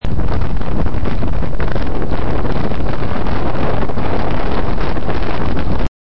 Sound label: the sound of harris, hebrides